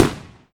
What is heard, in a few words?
CONFETTI SOUND